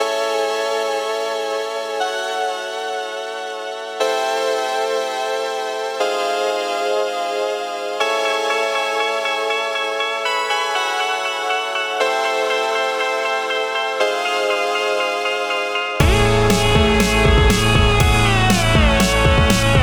Reverb Machine Bass Station Cassette Chorus, novation bass station